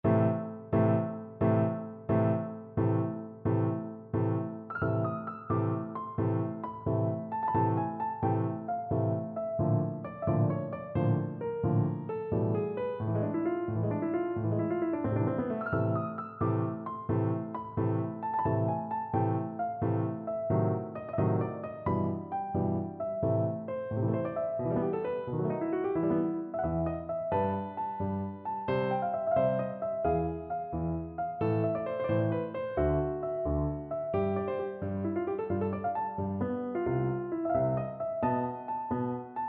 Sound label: rondo capriccioso, piano